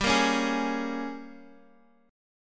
G sharp Major 7th Suspended 2nd Suspended 4th

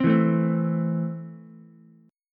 E Suspended 4th Sharp 5th E down Ukulele; Esus4#5 strummed

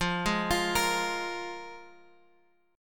F Minor Double Flat 5th Guitar Open G; down; chord shape

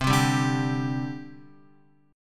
C Suspended 2nd, C, down, Guitar; Csus2 strummed

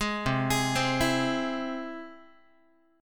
C Augmented, Guitar, chord; arpeggiated; JGuitar

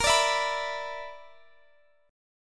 B Minor Major 7th Double Flat 5th A sharp, chord

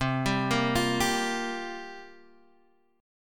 C 7th Suspended 4th, guitar; C7sus4 arpeggiated

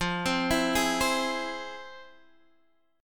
F Minor Major 7th, FmM7 chord; FmM7 arpeggiated; JGuitar

